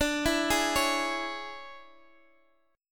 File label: C sharp Minor D; C#m/D; arpeggiated; JGuitar